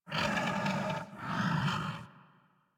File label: Feral Vocal Breath Walk; Enemies; Kubrow; Warframe